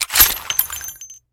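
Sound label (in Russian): освобождение барабана револьвера от патронов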